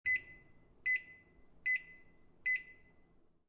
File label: Microwave Done Cooking Alarm, Household, Microwave, Done Cooking Alarm; Kitchen